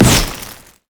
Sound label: frost flamebreath; LoL; shyvana